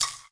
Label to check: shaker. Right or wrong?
Right